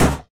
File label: pop